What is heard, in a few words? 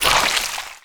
dismember blood; weapons; dota; pudge